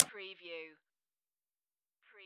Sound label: Pinball sound, clank